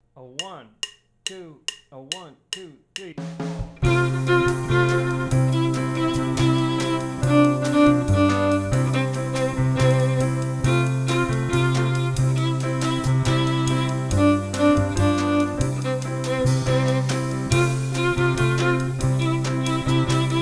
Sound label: Play Along Inner Melody With Rhythms